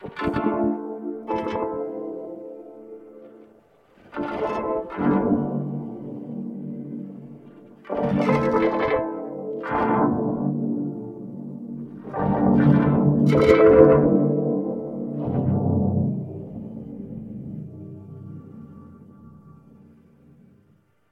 not a vocoder just a violin put through the multiband granular plug; meldaproduction